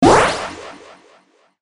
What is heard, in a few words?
pickup; fortress blast; shock absorber